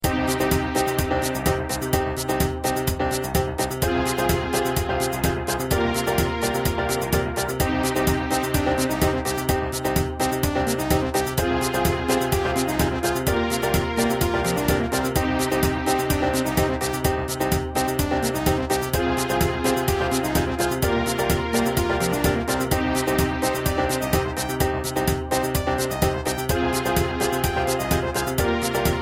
Tune Eurotrance

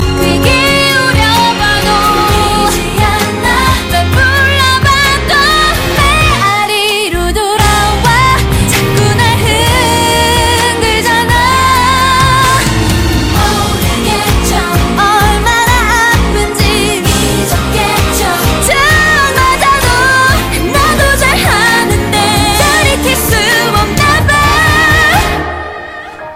Pop

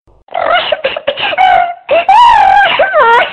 crying frog

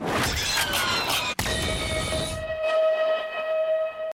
Beam Full

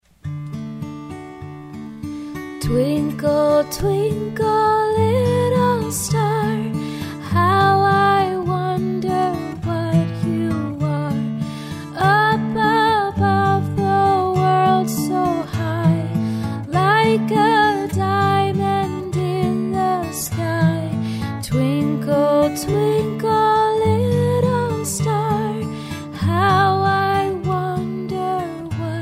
baby twinkle; children

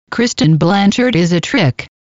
Is Calling Jazz

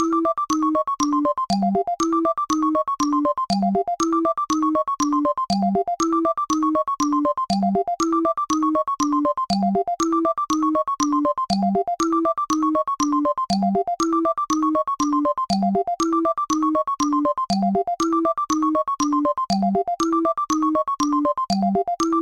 Chips Ringtone

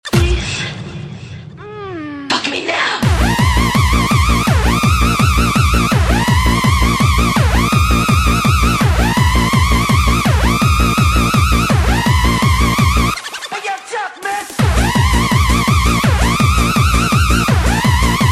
techno; electronic

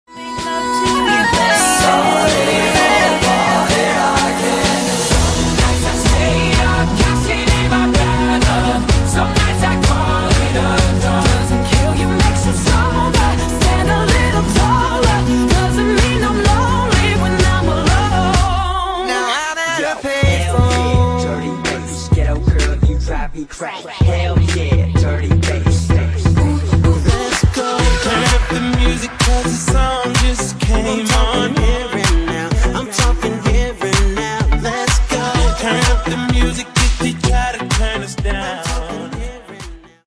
mashup of 50 pop songs

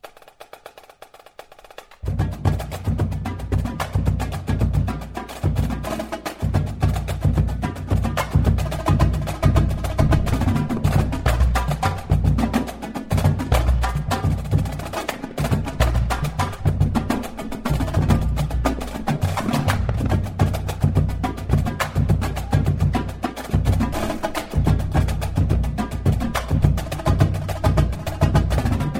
Groove

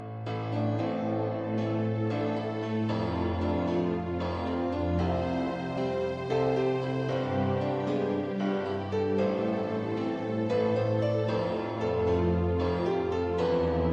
piano, ringtones; mgs

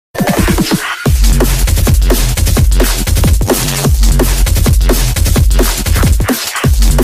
Remix

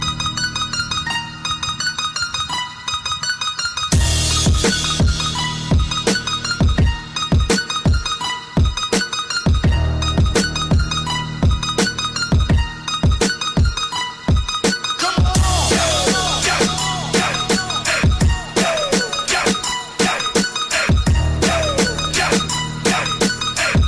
hip hop masive urban

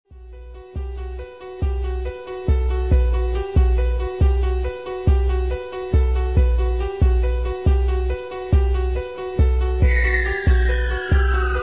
instrumental

